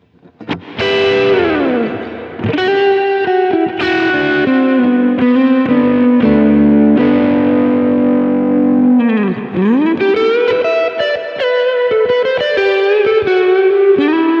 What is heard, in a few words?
Reverb Crunch Atmo; Prussian Blue